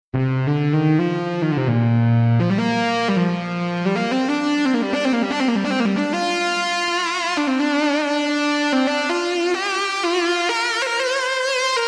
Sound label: fat elephant fx